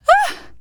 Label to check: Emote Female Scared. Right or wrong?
Right